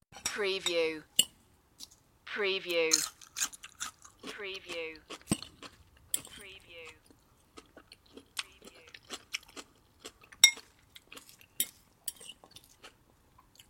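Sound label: Eating Salad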